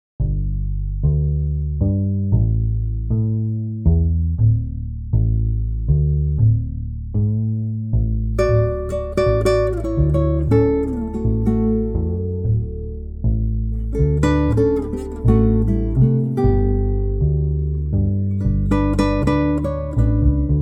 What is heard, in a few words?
guitarron and guitar tune